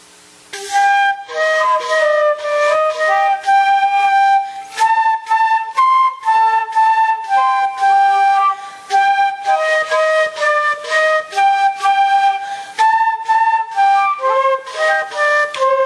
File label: Flute Piece